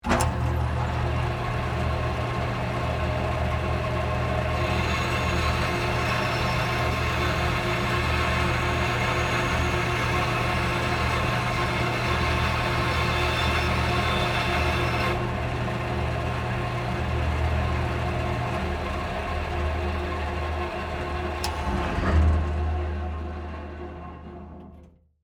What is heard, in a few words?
Band Saw Cut 1x4, Industry, Soft, Wood, On, Run, Off; Motor Sound Effects; BlastWave